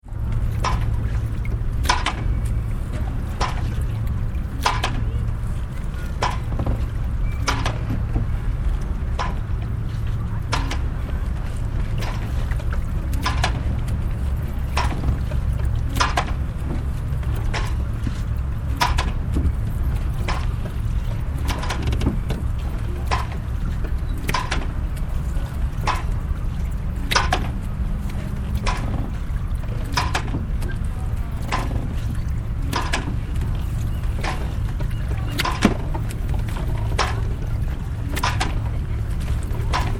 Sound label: Marina Ambiance